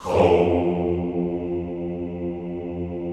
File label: HOUUU, Classical Choir